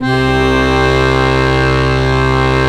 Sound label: ACCORDION C2; USB Soundscan vol.63; Balkanish Traditions; AKAI